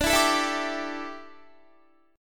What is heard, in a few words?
D Suspended 2nd Suspended 4th D down Guitar